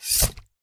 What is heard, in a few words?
meleeattack impacts slashing; psfx